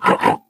ballbot hot laser

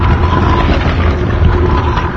concrete block scrape rough loop

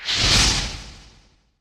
taunt fire; video games; source engine

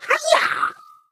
splits; Portal 2; Source Engine; video games; ballbot

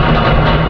freight move; Source Engine; bshift

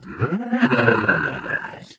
ballbot nice

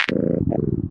combine button; Source Engine; video game

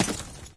player step; Source Engine; dod

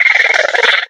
box monster chitter

